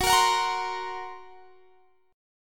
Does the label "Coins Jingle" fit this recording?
No